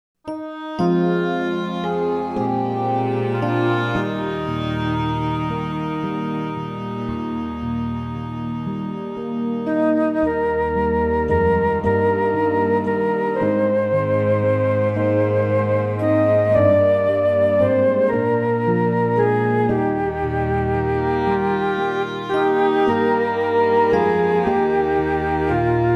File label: We Thank Thee Loving Father instrumental